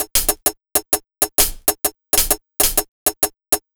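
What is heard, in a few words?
130 jam hi hat, Ragga & Dub Reggae; USB Soundscan vol.27; AKAI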